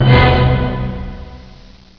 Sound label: OCTAVE B, ORCH HITS; MUSIC